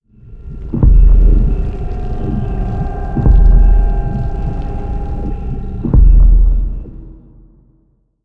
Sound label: relic activation; scales of the worm